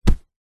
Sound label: clothing thick jacket punch sweetener; zapsplat